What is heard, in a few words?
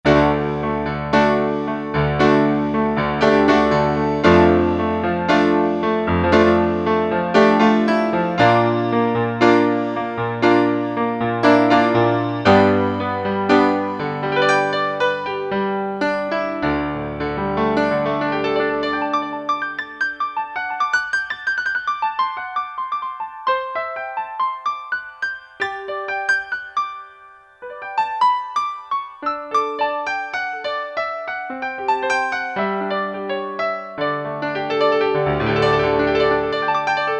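keyboard grand piano; E-mu Proteus FX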